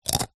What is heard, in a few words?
human eat crunch bite single rice chip